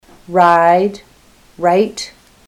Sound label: ride right